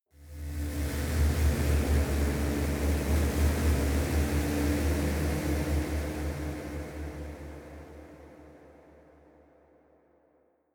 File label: space unknown evil spaceship; raumschiff